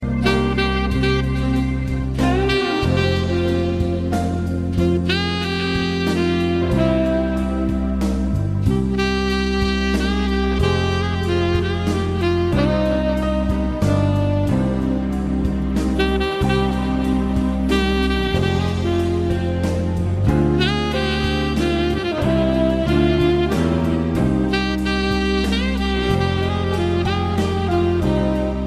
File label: Saxophon Talking